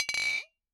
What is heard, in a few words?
Bottle Struck